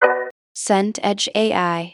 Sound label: string pluck